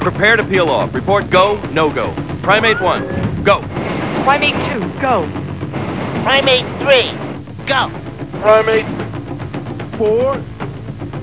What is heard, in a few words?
Peel-Off